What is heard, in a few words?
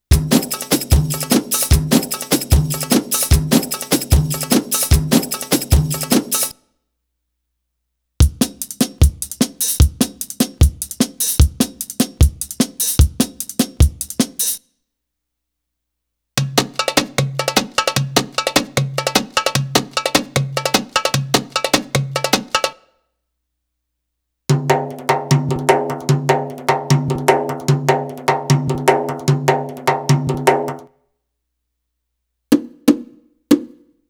Arabic Rhythms